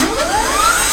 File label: X MACH, MACHINES, Production Effects